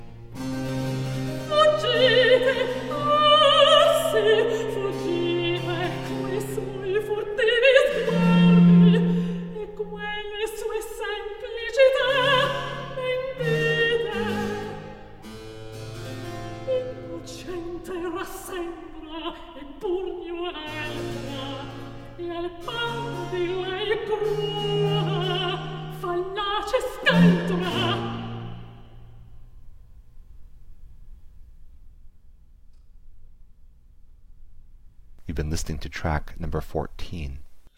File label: Cantata Recitativo, vocal